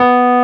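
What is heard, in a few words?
WURLY NO, Wurlitzer electric piano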